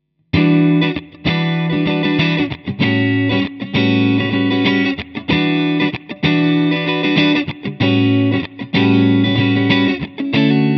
Fender Neck Single 335 Neck

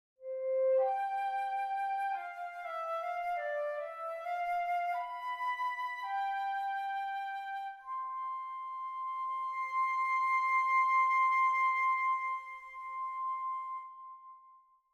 Flute session

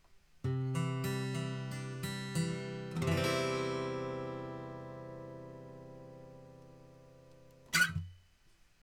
AG DM Fletboard; LCT040

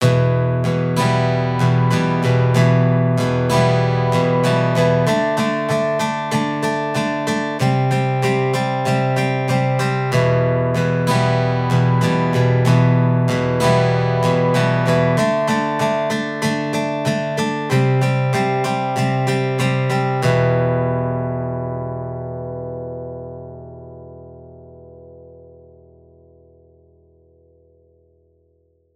delay drones digital decay dry; aas; objeq delay

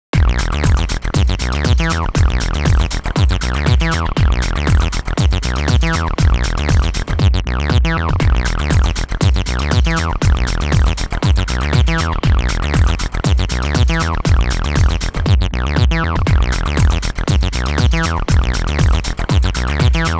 Synth Bass

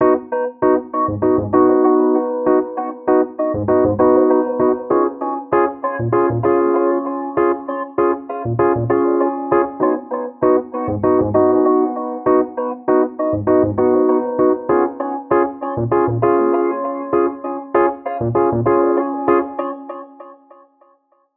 delay echoes freak delay wet; aas; objeq delay

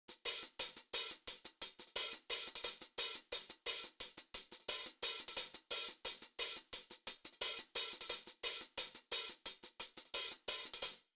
Hat 1073LBEQ Before; ハイハットサウンド試聴; 元の素材; Neve 1073LBEQ

